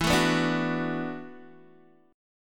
E 7th Suspended 2nd Suspended 4th, down, Guitar, DADGAD